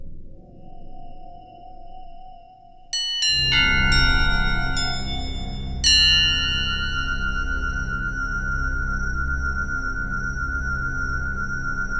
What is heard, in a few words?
horror